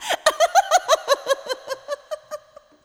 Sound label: laugh